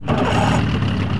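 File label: engine start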